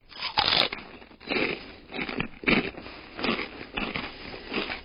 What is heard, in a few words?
eating